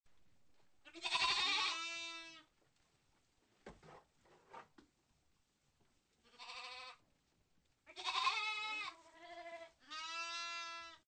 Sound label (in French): chevre